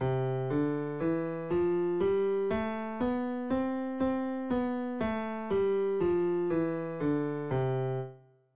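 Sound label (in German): Übergreifen linke Hand, klavier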